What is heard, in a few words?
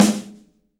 SD Real, Roland; Sound & Vision - Gigapack I